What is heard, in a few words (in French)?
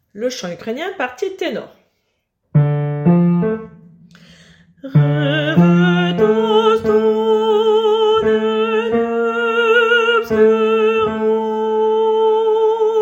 Chant Ukrainien Tenor